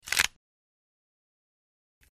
Break Or Snap Vegetab; punches kicks hits bones

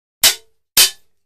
Swords; Metal Swords Hit. Two Times.

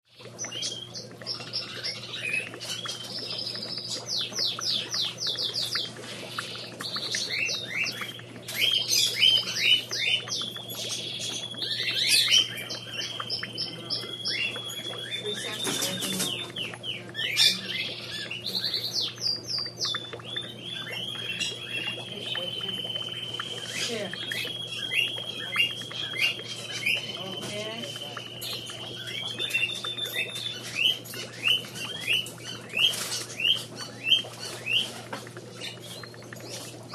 Pet Shop Birds Aquarium; CROWD -STORES AND HALLS PET SHOP: Birds & aquarium bubbling.

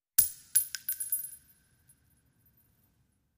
Shell Case Sngle Tube, shell casing movement

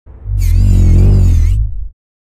Body Scan Heavy Synt

